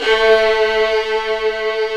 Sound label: VL SEC A2; AKAI S-Series CD-ROM Sound Library VOL-7; CELLO+VIOLIN